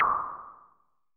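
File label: Nord noise, techno; Drums In Your FACE!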